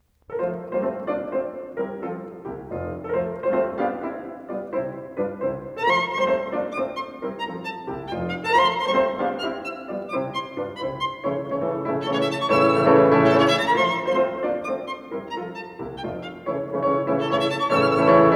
Sonata for violin & piano No. 5 in F major, Scherzo: Allegro molto; Beethoven; ＂Spring＂